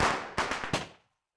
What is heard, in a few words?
firecracker